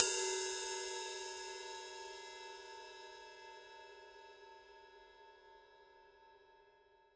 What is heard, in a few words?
paiste pst 5 rock ride 20 body